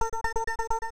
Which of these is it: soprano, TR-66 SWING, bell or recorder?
bell